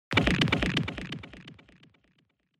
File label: Fracture Delay; 骨折; ディレイ